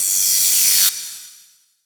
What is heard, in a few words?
D+B